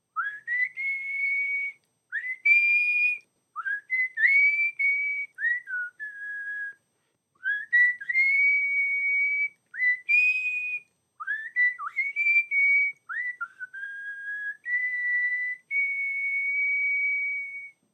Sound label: whistle